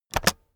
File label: keyboard external space press fast heavy